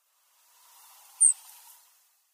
wakiaka, makurazaki

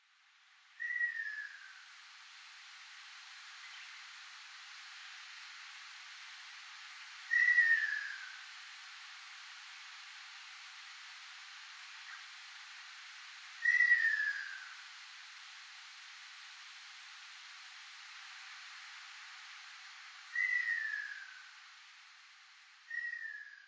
rakashobin; yambaru

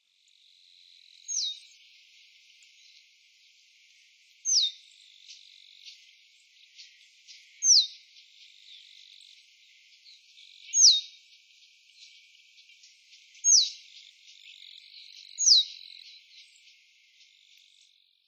ojurin; watarase

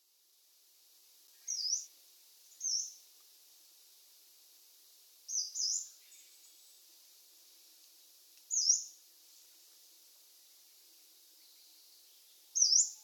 karafutomushi; nagasaki

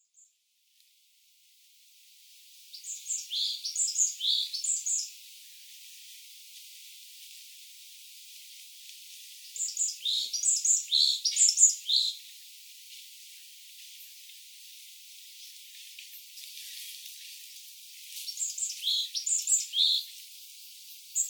oriiyamagara song; iriomote

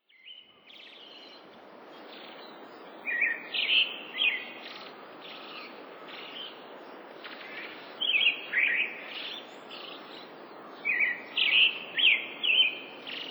shirohara, utsunomiya

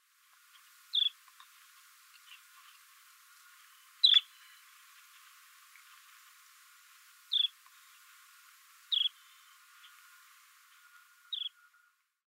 saruhama; himeji

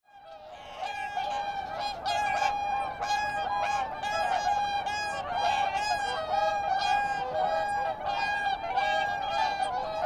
ohakucho; izunuma

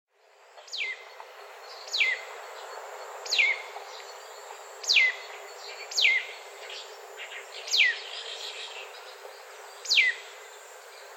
kaojirogabi; watarase

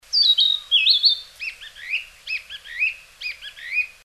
kibitaki kojukei; mane

